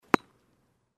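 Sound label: tennis ball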